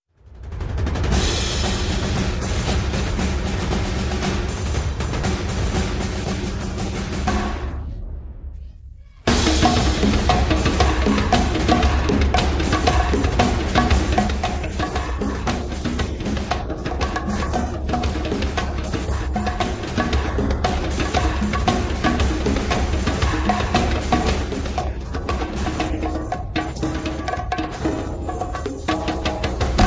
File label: drums